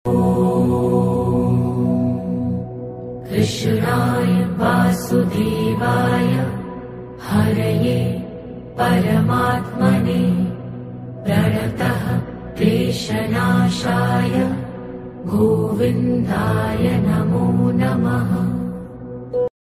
powerful krishna mantra krishnaya vasudevaya krishna chanting meditation music